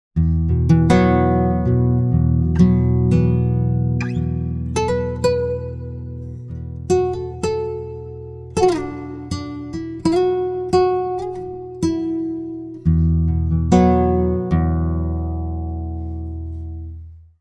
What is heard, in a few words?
guitar, pitchshift